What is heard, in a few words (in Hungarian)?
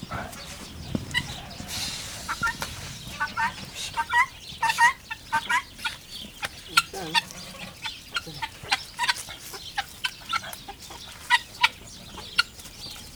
rikácsoló gyöngyös; parasztudvar; gyöngytyúk